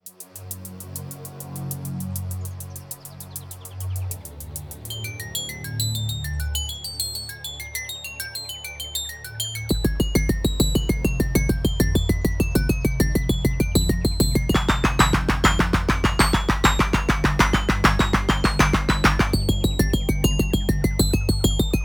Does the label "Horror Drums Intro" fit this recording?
Yes